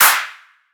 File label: clap riddim